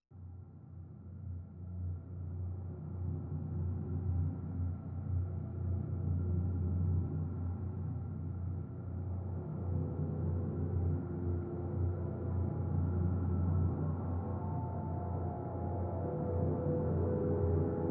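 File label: Drone Bleeding Out E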